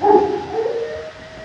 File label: animal howling; animals